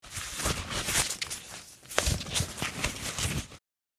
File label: Gas mask wearing; military equipment sound effects